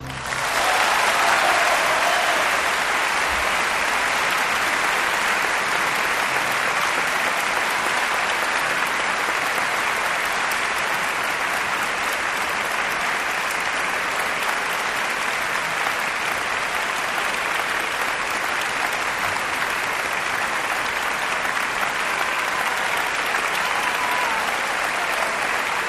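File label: Applause Symphony Medium Distant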